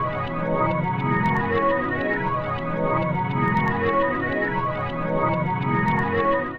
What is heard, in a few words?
AMBIENT PAD; Trance; AMG - Global Trance Mission VOL-2